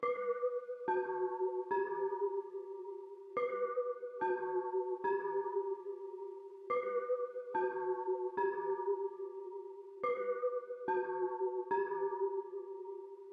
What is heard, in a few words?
144 BPM E Min Pluck; Stems; Cymatics; High Ground